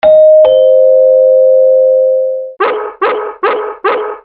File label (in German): 2 Klang Hund; mistral 600